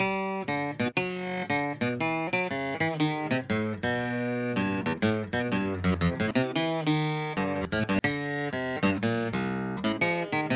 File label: guitar; Audio Analogies